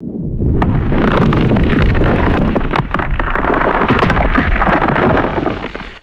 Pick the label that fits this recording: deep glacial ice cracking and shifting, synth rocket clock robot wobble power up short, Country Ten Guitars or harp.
deep glacial ice cracking and shifting